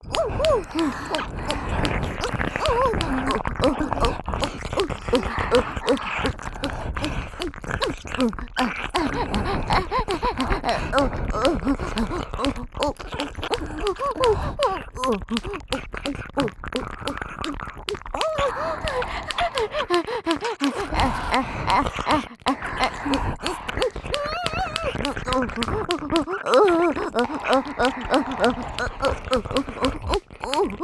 オホ喘ぎ ＋ 両耳れろれろ舐め; おまけループ（オナサポ）; 3WAYループ; SE有

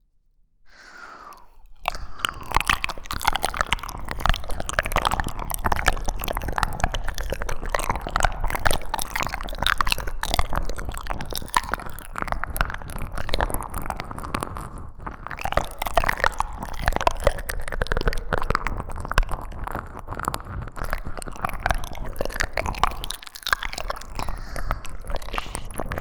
お風呂耳舐めコース

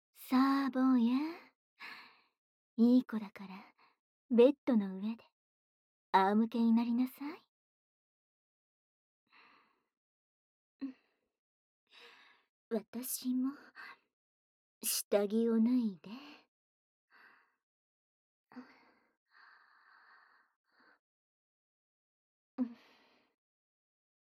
甘やかし耳舐め; SEなし; 甘やかし耳舐めSEX; ショコラ

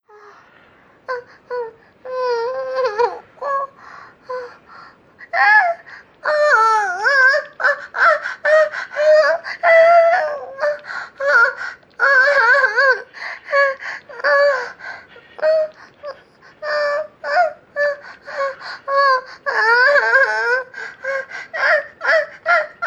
手マンでマンコ調教される美少女JK 喘ぎ声

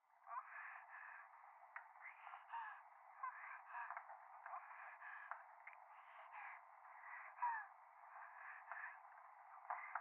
右耳 密着 処女 喘ぎ 電車

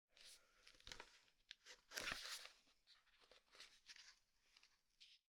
本を開く; 効果音

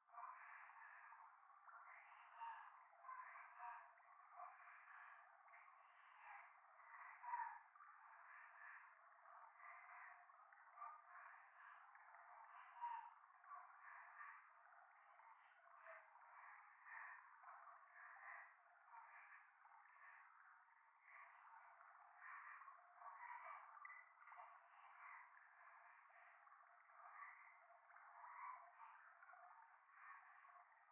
右耳 密着 処女 喘ぎ 川のせせらぎ; 夢の中

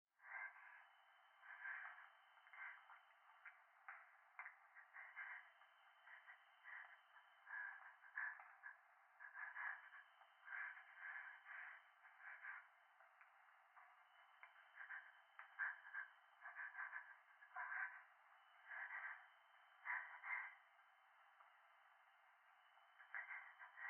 風呂 右耳 快感 吐息 山奥

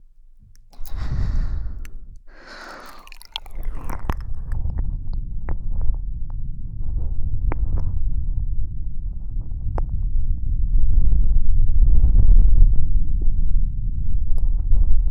両耳挿れっぱなしコース

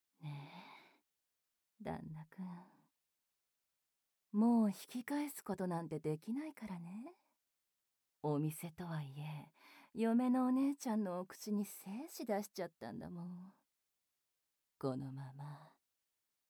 パンパンピストン騎乗位エッチ; 特別な秘密はお姉さんと

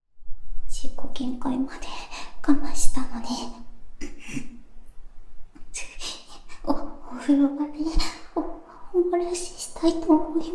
おしっこ実演 ギリギリまで我慢してお風呂で立っておしっこ編

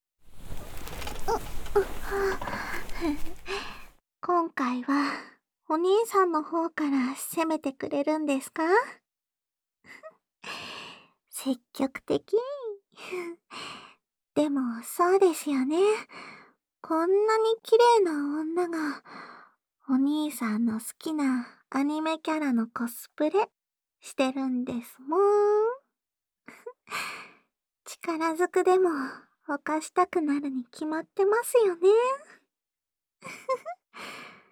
低音おほ声着床セックス

